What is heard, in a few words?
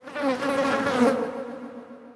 decay transfer; undying